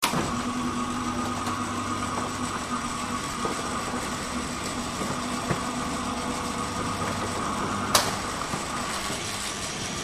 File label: shutter